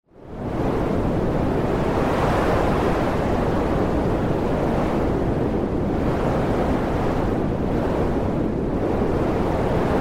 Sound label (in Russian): Uragan